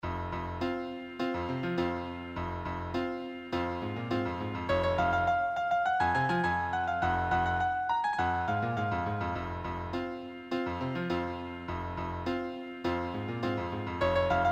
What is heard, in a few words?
advanced solo sheet, piano